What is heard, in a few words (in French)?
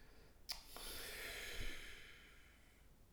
soupir; Hadjithomas Joreige